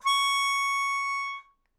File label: Melodica Long, Long Notes Chromatic